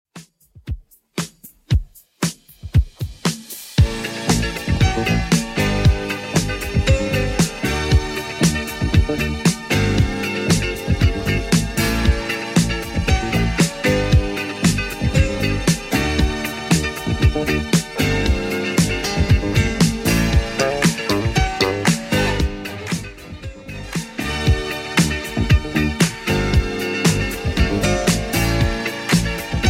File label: 70s Redrum 116bpm